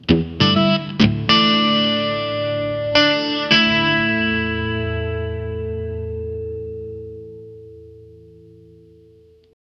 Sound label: dramatic western twangy g, guitar